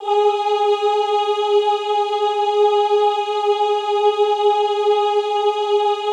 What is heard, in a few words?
OOH G3 R, Extended Classical Choir; Best Service; OOH MIX